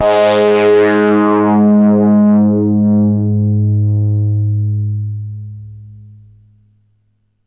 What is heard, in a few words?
G sharp 2, Nasal Hard Synth